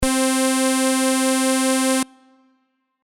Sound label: Env VCF before